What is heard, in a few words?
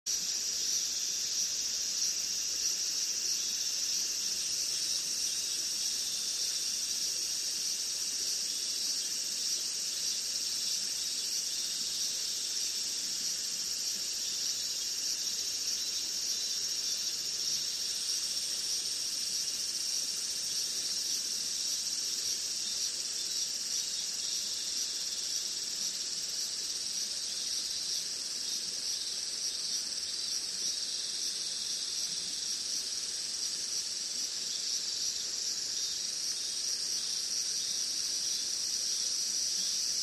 riverside; Kyoto U; Ashiu